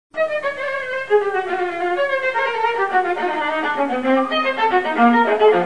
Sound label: Viola